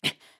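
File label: Climb, voice; BOTW; Breath of the Wild; Link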